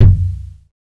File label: solid bass drum f sharp key